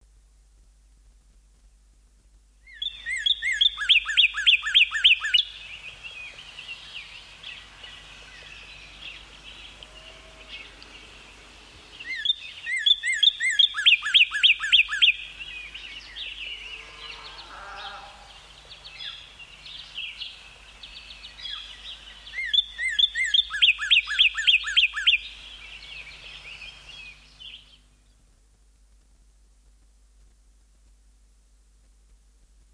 northern cardinal, songbirds

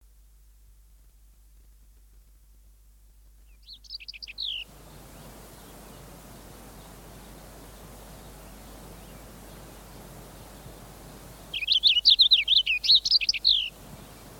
purple finch, songbirds

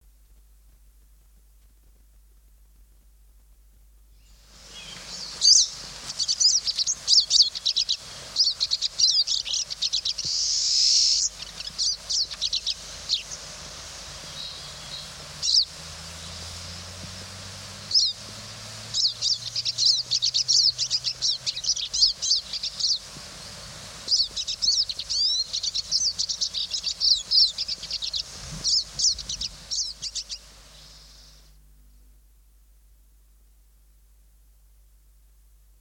pine siskin, songbirds

